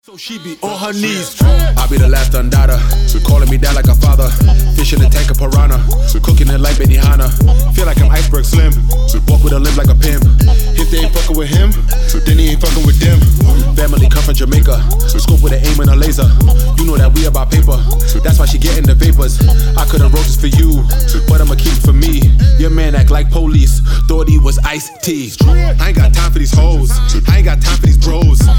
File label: HIP HOP